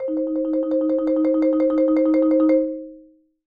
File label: Marimba roll G#3; Sampleheads - Dave Samuels Marimba & Vibes